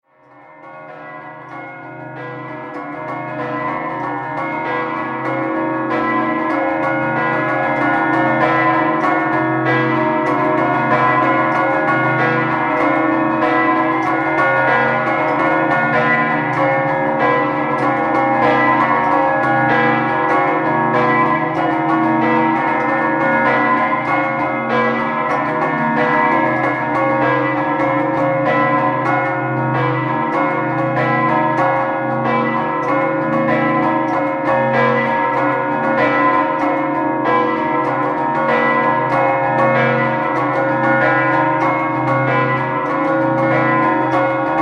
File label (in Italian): SAN DANIELE Duomo, campane